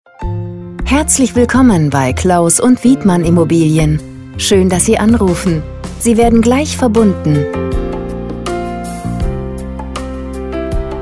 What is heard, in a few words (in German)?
Warteschleife; Telefonansage Immobilien – Makler